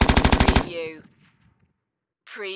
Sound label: Machine Gun Russian PKM Long Burst Close Perspective